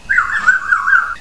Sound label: SIREN, parrots